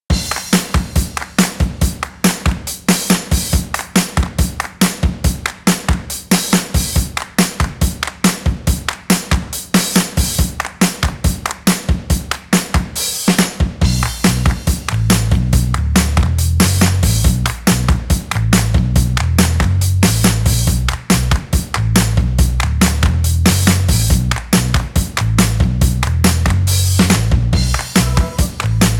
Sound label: Band